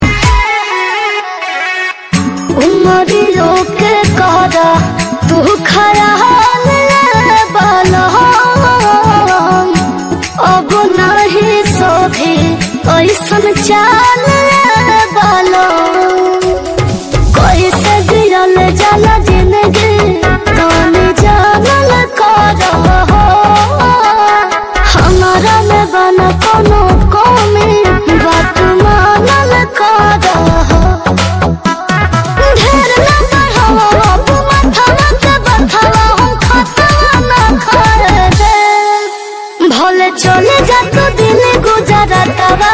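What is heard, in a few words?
bhojpuri sad song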